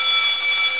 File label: Jackpot